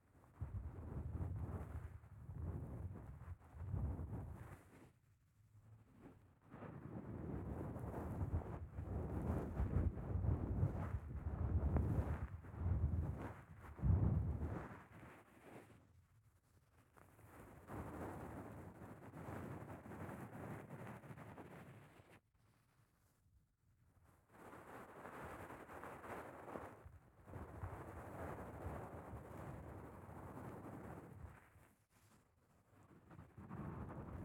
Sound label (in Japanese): もふもふ手袋マッサージ, ASMR